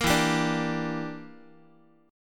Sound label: E Minor 7th Flat 5th E, Guitar; strummed